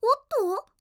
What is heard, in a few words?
exclamation; Windows; Madobe Ai